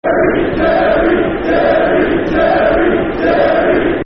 Football Chant; Jerry, Jerry, Jerry; Cheltenham Town Ctfc

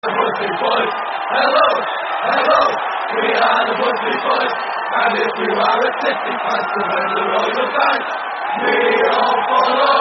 With Crowd; Man United

